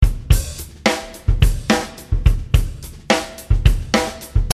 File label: Synco Shuffle